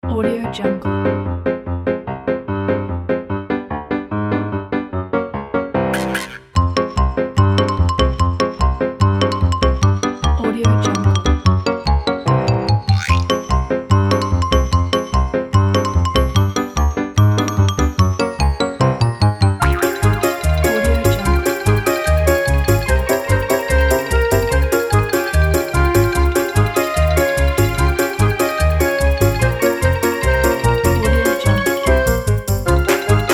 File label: comedy sketch